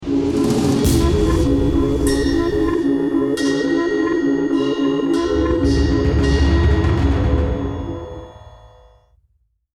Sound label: lost round, music